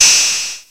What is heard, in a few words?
Jomox Xbase888; Drum Machines